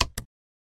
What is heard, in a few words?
ghost radio click; winchester